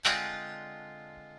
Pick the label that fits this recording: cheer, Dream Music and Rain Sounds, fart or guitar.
guitar